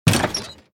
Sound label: Chest Open